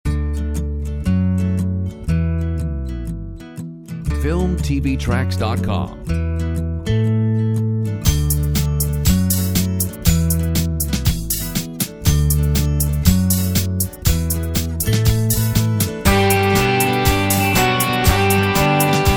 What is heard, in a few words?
Kids Pop